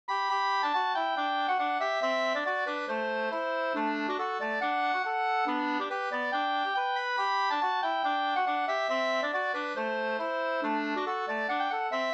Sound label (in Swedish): schottis; Trosaskracken Sd 2st V1